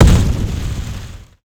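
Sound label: explosion turret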